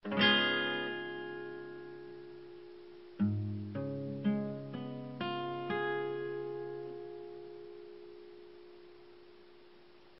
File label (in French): Accord C diese 7